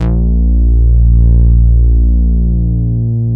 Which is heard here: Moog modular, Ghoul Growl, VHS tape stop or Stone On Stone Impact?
Moog modular